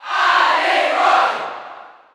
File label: Cheer French NTSC; Roy; SSBU